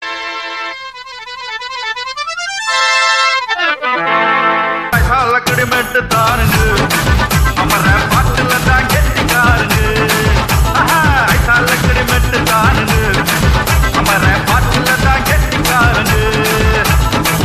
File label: Tamil Remi